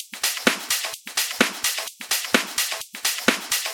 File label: Minimal Loops